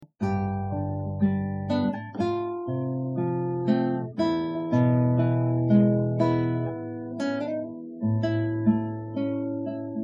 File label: sunny autumn guitar